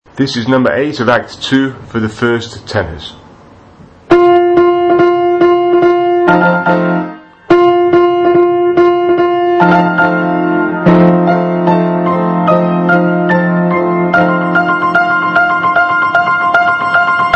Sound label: 1st tenor; gondoliers